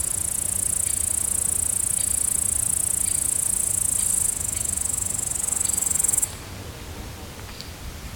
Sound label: Tettigonia cantans; Brzeznica; OrzechowskiR